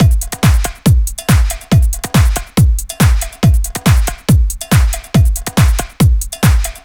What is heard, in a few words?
140 House Beat; Dance DJ; Drums; House Beats; Pro Tools Essential Loops; Digidesign